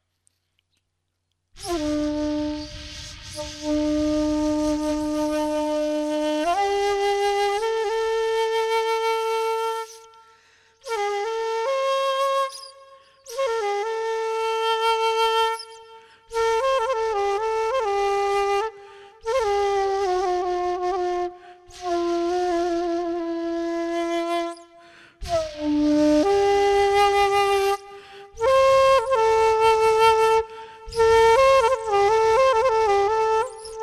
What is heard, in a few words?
Sikuhachi